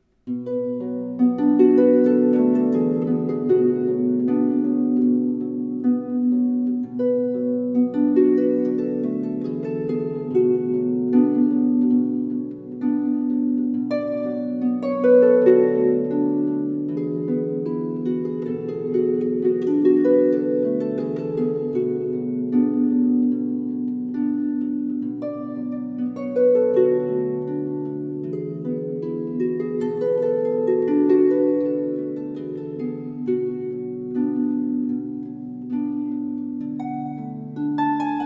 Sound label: harpist